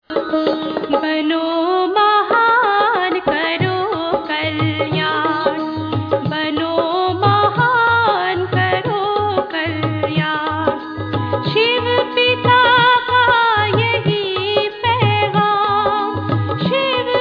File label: Devotional